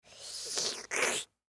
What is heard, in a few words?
Airy Slurp Male Two Year Old Boy; Slurping; Human; Blastwave